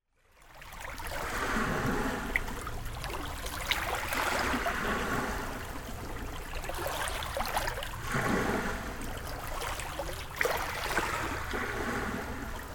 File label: Flute Ocean Waves